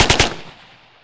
w aks74u; The Sum of All Fears

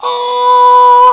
ahh; 7invasores

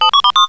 coin

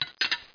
plate

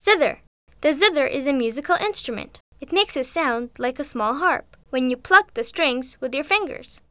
zither

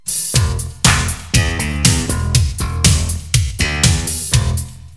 FUNK BASS; L'Effet Pommier - Volume 04; MUSIC

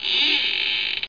trunk open

